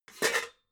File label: pots clank